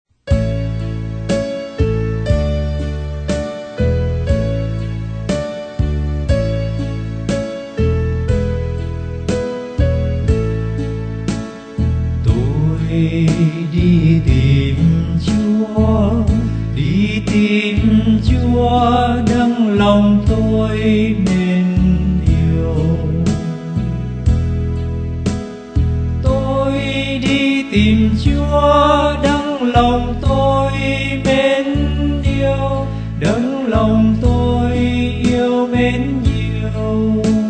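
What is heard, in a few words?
soprano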